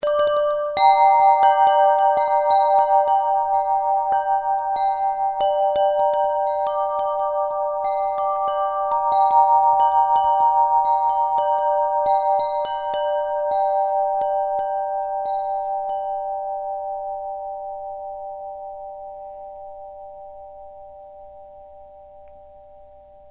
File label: Wind Chimes